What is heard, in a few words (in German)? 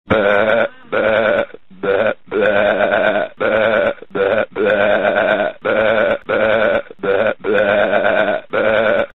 schafe